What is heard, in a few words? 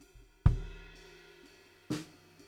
BD Out